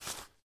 Paper Drag start; in-game sound effects; Papers Please; android ios windows gamerip 2013

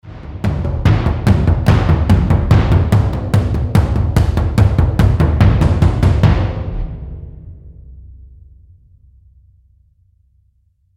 Primal Infected Drums End; The Last of Us

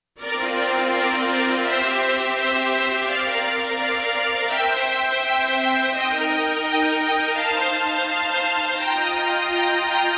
World 1 Completed; Super Mario Galaxy 2; Wii

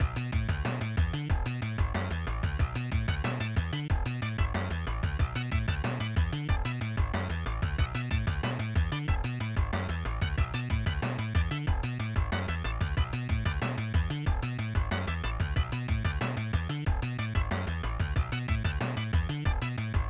Level Complete; T2 The Arcade Game; SNES

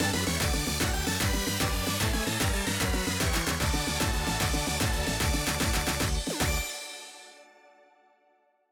Gameset

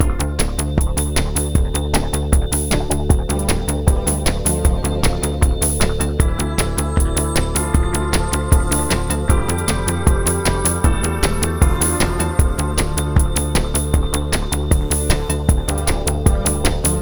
music

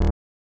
Error; katou hifumi kudan shougi club; snes

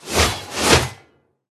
Aimed slashes; Hero Veruk

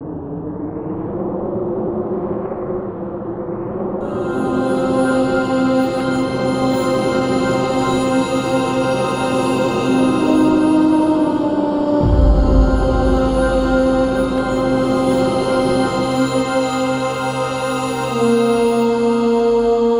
Soul Mate Lost - Game Over; Ghost Blade

